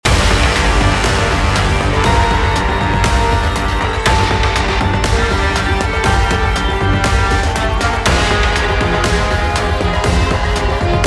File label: Battle Loop; Resonance Solstice